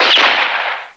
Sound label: gunshot